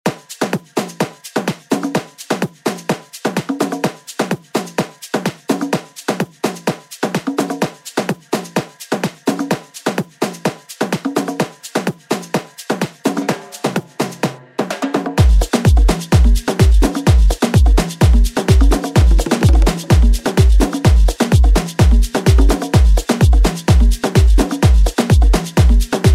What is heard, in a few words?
Afro House; Deejay Hit